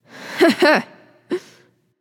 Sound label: hehheh; smite; scylla